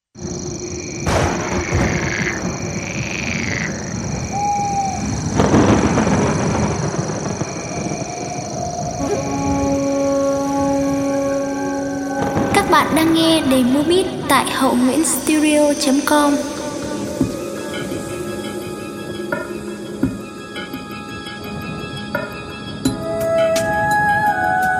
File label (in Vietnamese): beat